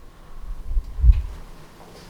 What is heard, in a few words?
heartbeat